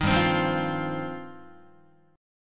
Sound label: G 7th Suspended 4th Sharp 5th, Guitar